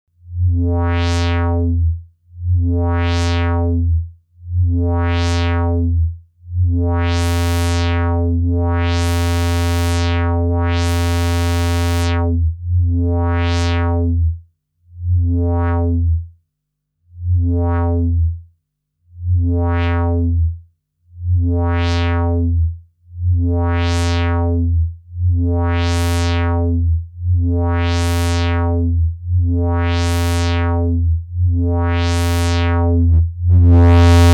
S100 Filter Sättigung Fuzz; logicx

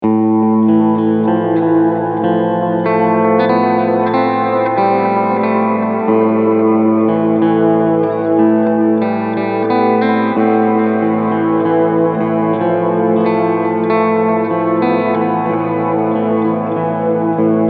4tel Delay Reverb

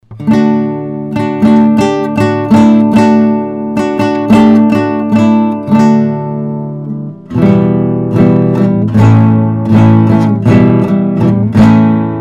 NT1 5th USB Gitarre extrem uebersteuert

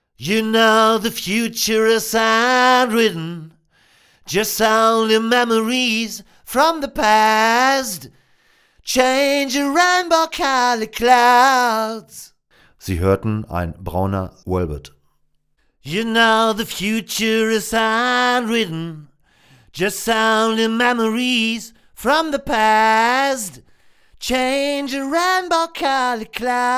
Röhrenmikrofone

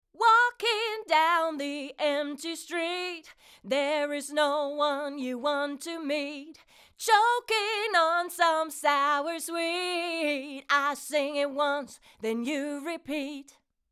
Vocal ohne; Rupert Neve Designs 5254

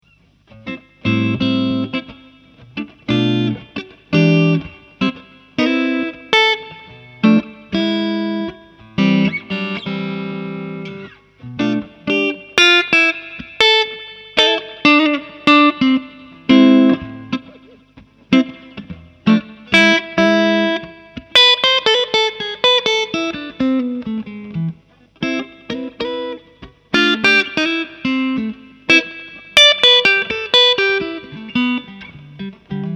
hals clean mehr hall

